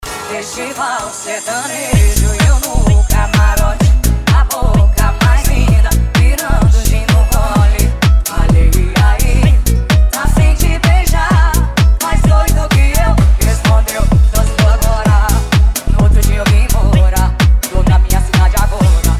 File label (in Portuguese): sertanejo remix